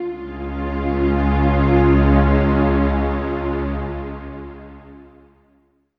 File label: NRG Pad